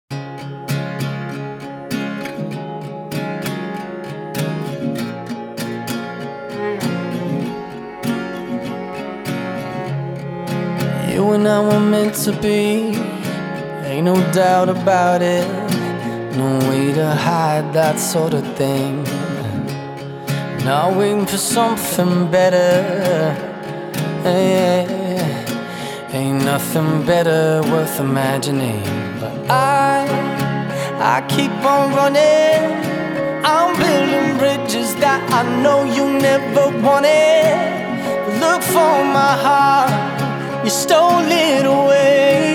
acoustic version

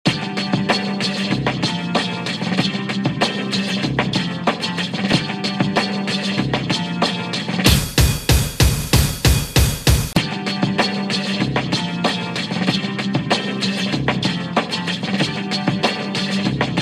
tier instrumental guitar mix

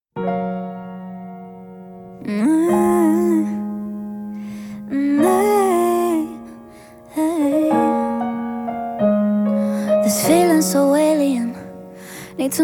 acoustic

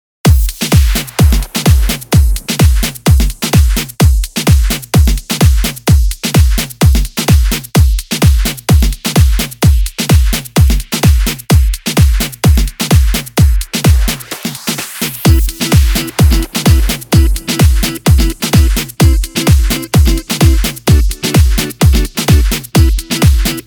remix

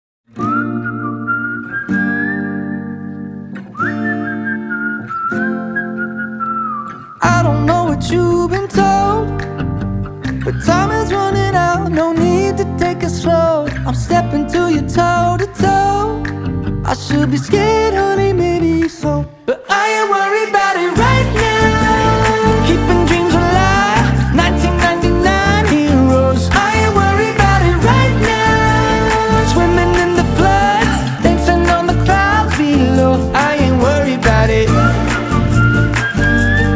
acoustic

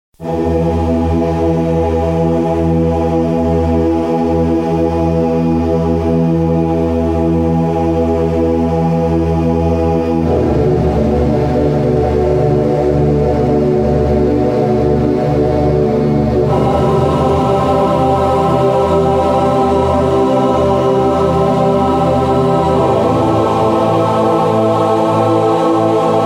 instrumental

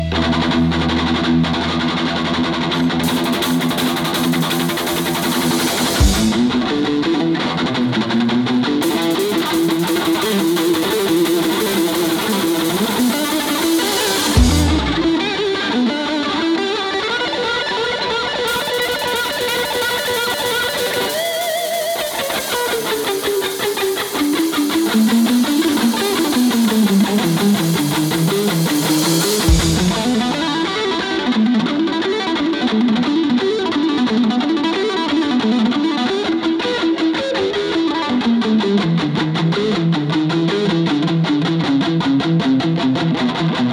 guitar solo live

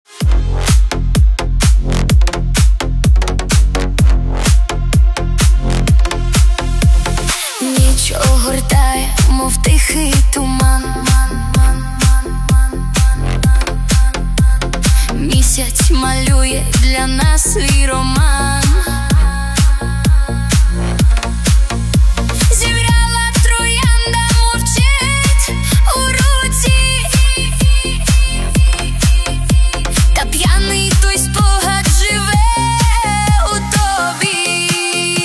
piano

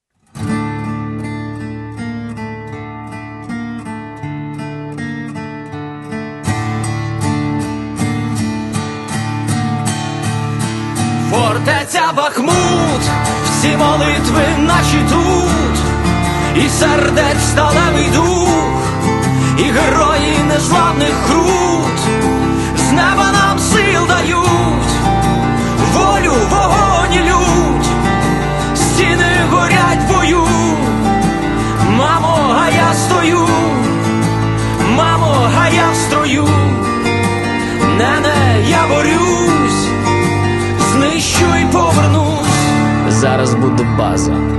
acoustic

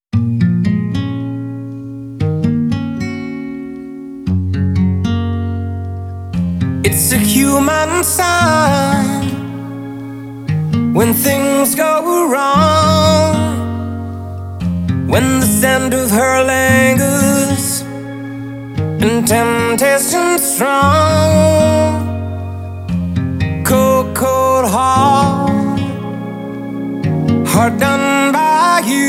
acoustic

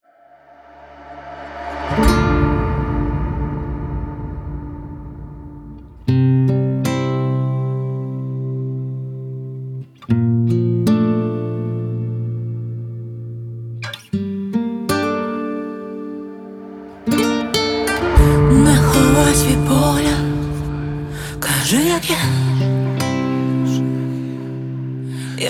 acoustic

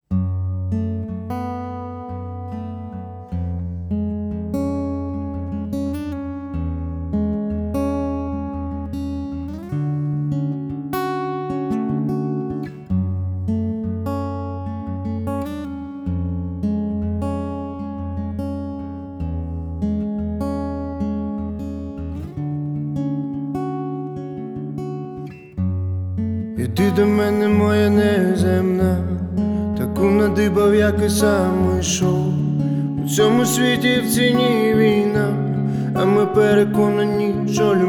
live acoustic

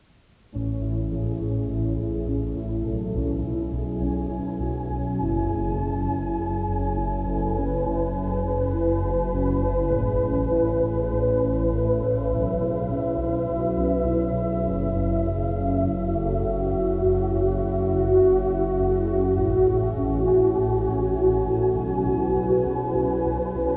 milk cassette slowed reverb

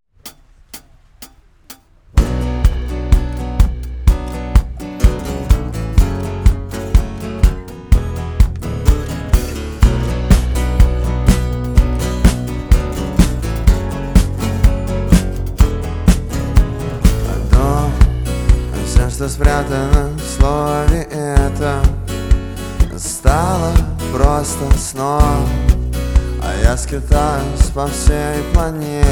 acoustic live

